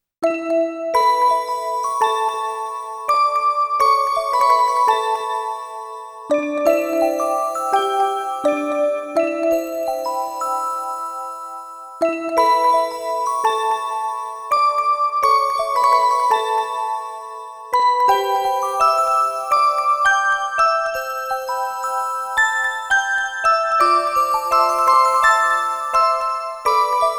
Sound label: Music Box; KID BGM Collection Vol.1; Tentama 2wins BGM Collection